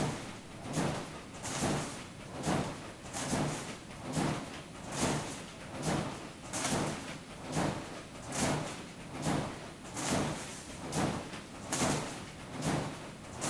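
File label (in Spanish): Ambiente Maquinas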